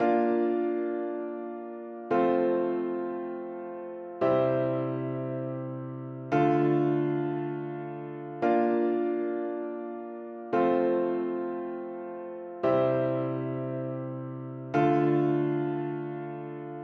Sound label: keys piano D2